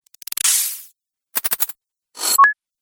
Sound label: Various Game Sounds, video game sound effects